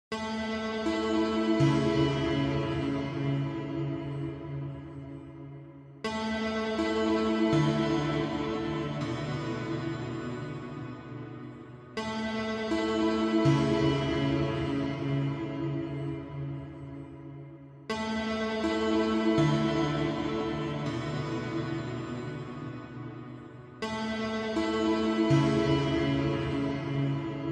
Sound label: Icy Piano